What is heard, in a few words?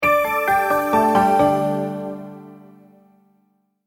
multimedia logo, Musical Identity/Signature; Sonic Logos; Sound Design; Short Musical Signature / Ident